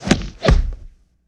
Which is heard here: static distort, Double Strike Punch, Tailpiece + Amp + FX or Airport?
Double Strike Punch